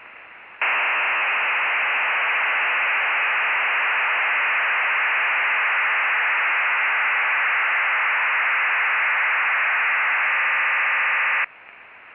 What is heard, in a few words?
MIL188-110A 150S; MIL-STD 188-110A/B serial tone waveform with 150bps and SHORT interleaving